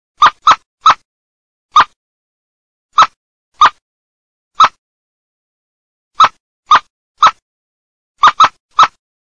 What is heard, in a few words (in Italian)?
Merlo CHIOCCO PIPPO, birdsound